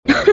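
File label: cough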